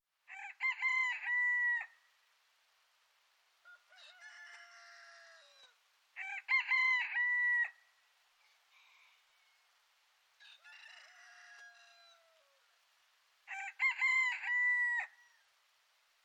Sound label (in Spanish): El Gallo, sonidos de la naturaleza